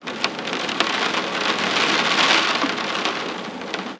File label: rain